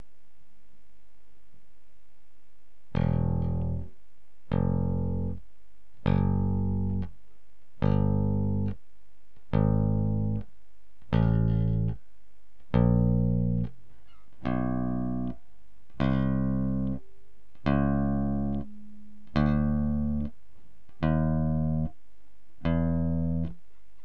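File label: PLECTRUM, BASS GUITAR; cubase; BUILDERS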